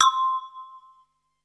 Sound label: PERC C5; SEQ AND PERC; Music Store - Claudius Diemeter Schwerlos